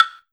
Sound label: CLAV, Ragga & Dub Reggae; USB Soundscan vol.27; AKAI